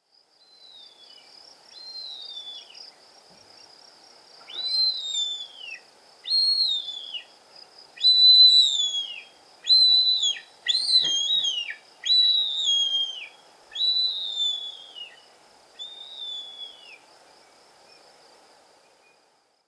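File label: Lybiidae, bird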